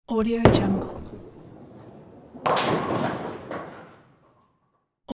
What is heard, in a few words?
bowling strike